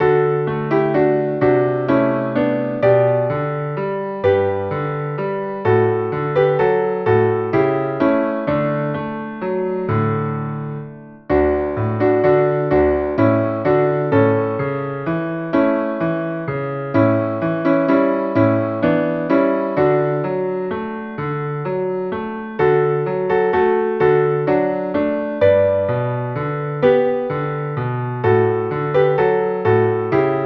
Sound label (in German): Klavier